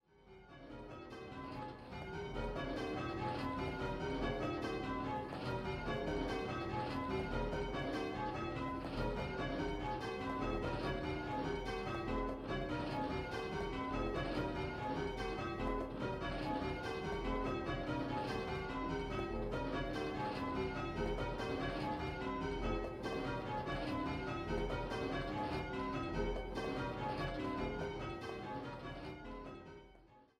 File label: Yorkshire Major, bells